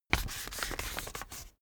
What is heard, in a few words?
sheet fold short